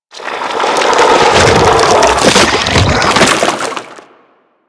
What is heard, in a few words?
Infested Hive Swell Movement; Ambience; Props; Warframe; Corpus Infested